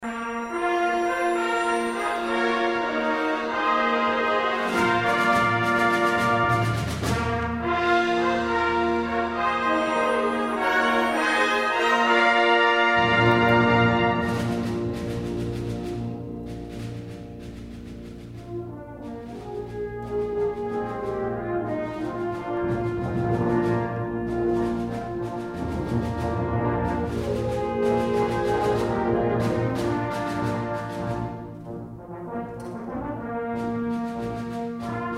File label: Fanfare, brass band; NABBA